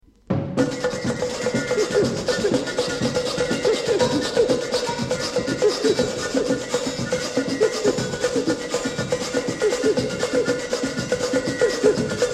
Batucada

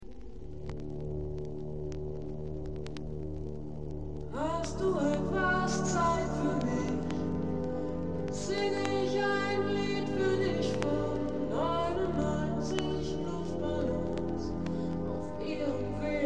jazz

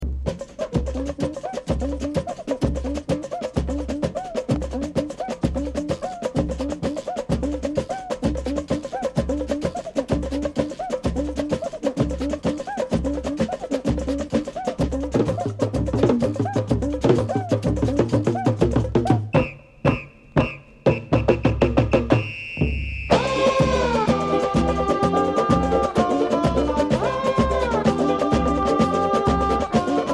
samba

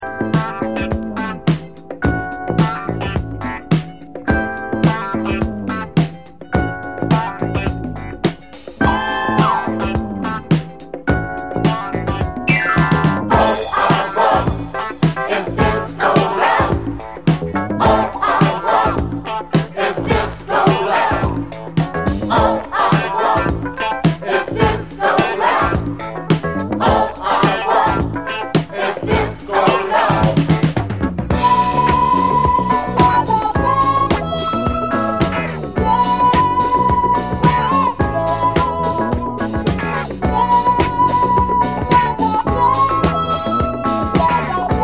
Disco

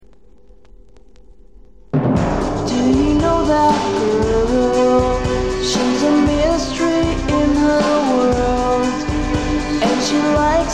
Disco

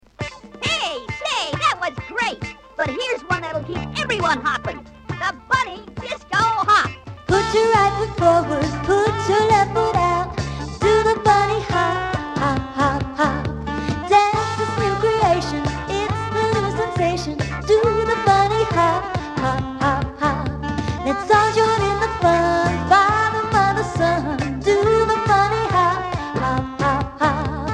Disco

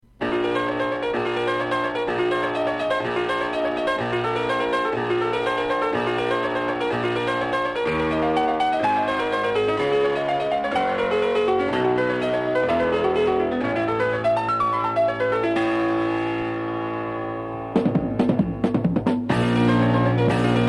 etude in the form of rythum n blues

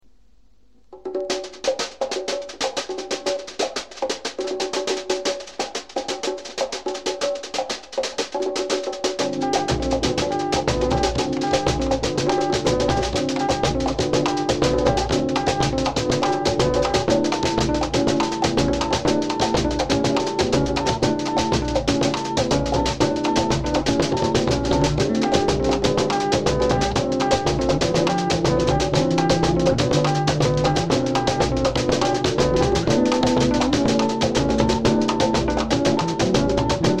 Berimbau

